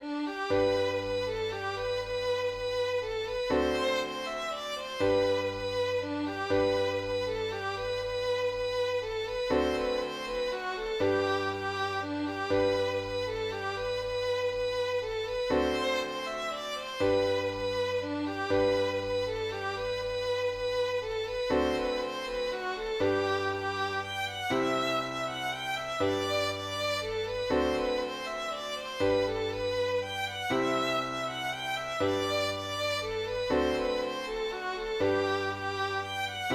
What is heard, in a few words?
mazurka